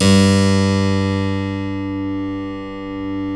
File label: G 2 CLAV; USB Soundscan vol.17